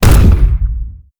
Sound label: adv kinectic fire, weapons; Stellaris